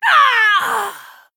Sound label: Female Human Death